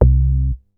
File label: Moog; leo-sounds